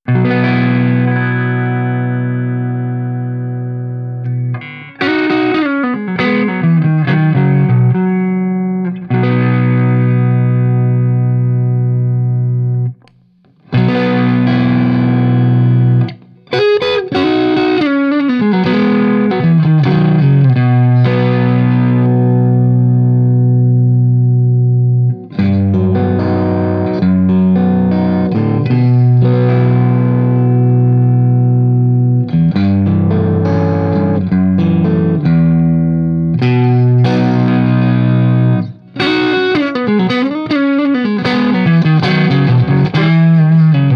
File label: Rattler, jam pedals